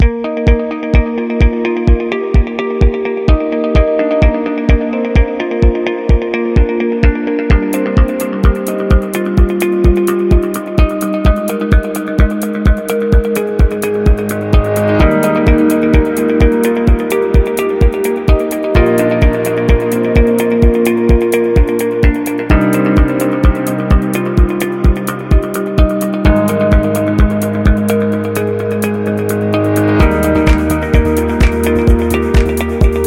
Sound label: Business Music